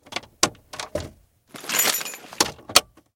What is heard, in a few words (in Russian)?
treskuchij otrivistij zvuk otkrivaniya dverej